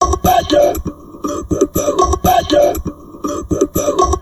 TALK, Drum Loops Crazy Processed

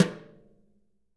BRUSHES, Living Drums

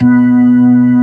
HAM B3 -L, KEYBORD

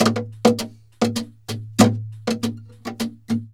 flamenco guitar, duende fx

